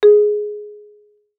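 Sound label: Marimba rubber fortissimo Ab4; MIS Pitches - 2014; Percussion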